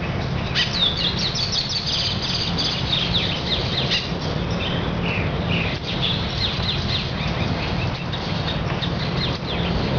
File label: jungle